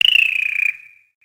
plains bird

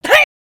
melee minifig grunt; foley

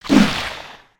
baby spider attack; creatures mechanical

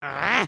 Charge Grunt; emotes

